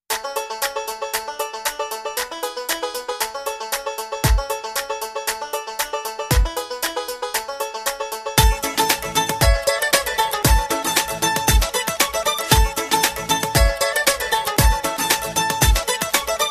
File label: rodeo tune